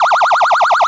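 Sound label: emergency vehicle phaser, sirens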